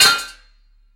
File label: target hit